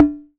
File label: TUN C4, Yamaha TX81Z tuned perc